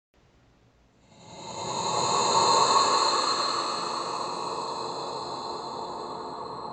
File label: Void Wind Effect